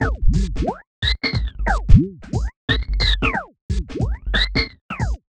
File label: whistlebeat stop 090bpm; Zero-G; Downbeat Dance Grooves